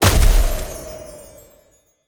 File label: Grenade Snowball Explosion